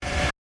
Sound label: Distorted Scream; SoundsCrate